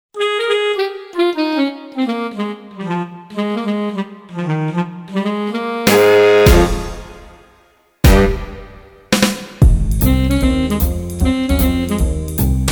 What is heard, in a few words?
Big Band; Soundzabound Volume 3 Disc 1